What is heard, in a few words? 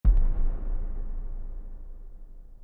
Hit